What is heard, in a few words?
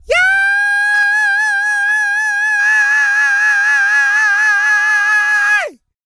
YELL, MALE HUMAN; Spectrasonics Vocal Planet